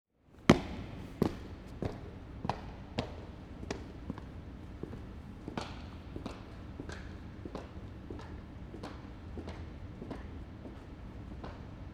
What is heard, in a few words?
Foley Footstep Walking Bleachers At Empty Ice Arena From Top; Foley Footsteps